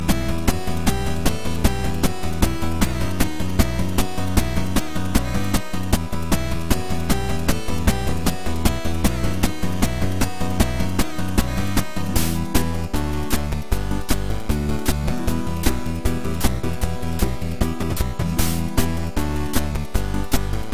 8 bit

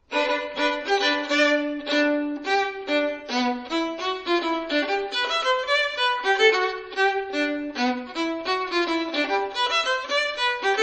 violin

